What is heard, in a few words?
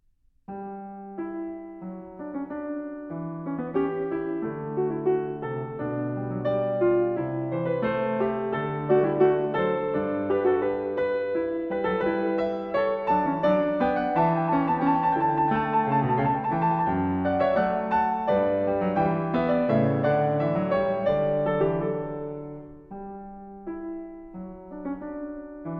Goldberg Variations BWV 988 Variatio 4 a 1 Clav.; KIMIKO ISHIZAKA; Variatio 22 a 1 Clav